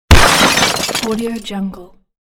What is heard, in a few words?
Crash